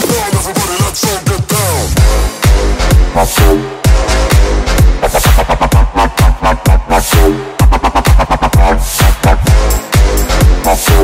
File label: electro; roundsound